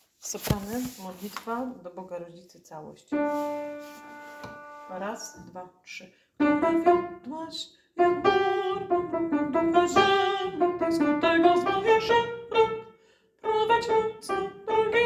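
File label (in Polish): Sopran Całość; Modlitwa do Bogarodzicy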